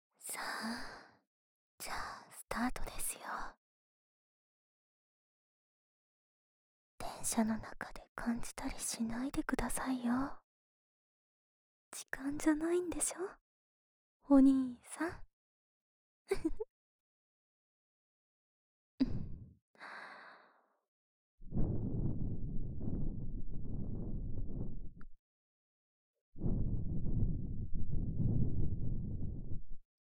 右耳 電車音なし お耳ふーふー; おっぱい押し付け誘惑

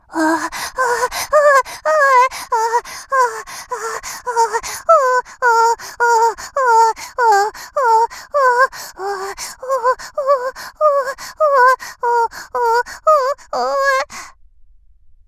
おっ！♥おっ！♥おっ！♥おっ！♥

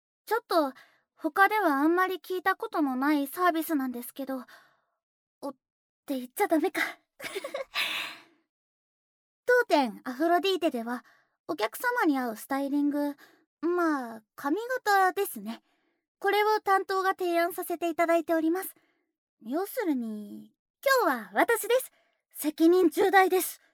シャンプー, ASMR

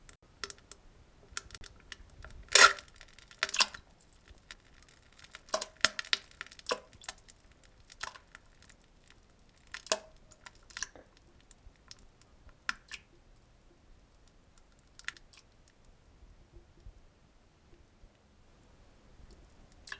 ほぼ治りなんべん：ち むちち ちちち ぴぷぅっ（ぽちゃん）むち（ぽちゃ ちゃ ちゃちゃ）むちち（ぽちゃ）ちち（ぽちゃん ちゃ ちゃ ）

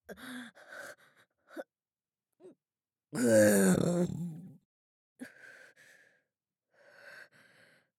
ザーメンゲップ（小）; ザーメンゲップ集

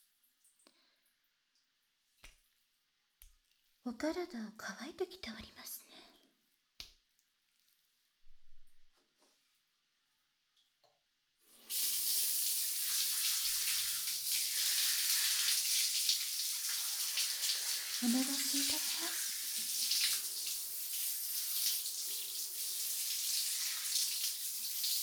お風呂場 お背中流しっこ; お風呂場のえんまさま

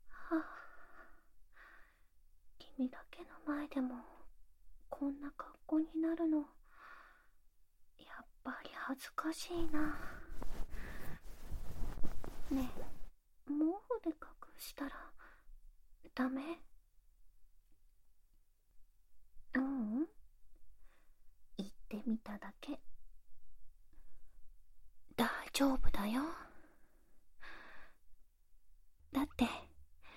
いっぱいいちゃいちゃしよ (キス・耳舐め)

